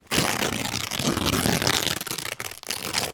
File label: wrapping paper rip; foley; zapsplat